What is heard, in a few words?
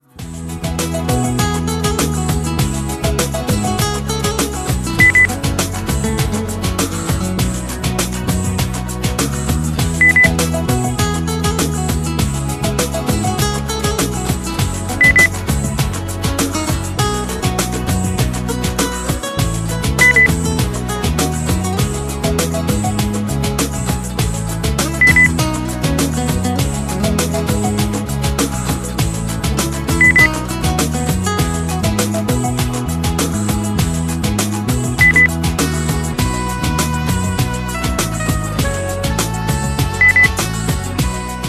soft